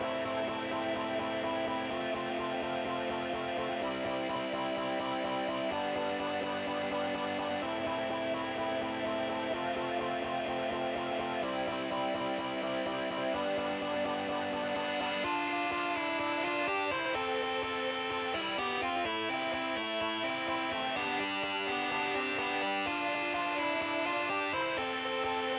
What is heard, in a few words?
Synths Chords Dirty